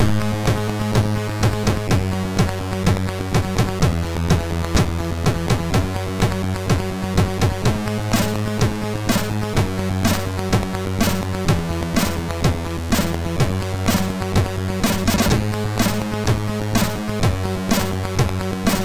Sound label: MOS6581R3, GAMES; Shellshock